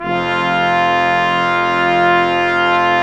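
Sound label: BRS QUINT, brass quintet; Roland L-CDX-03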